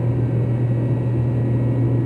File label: Freezer; zps; Ambient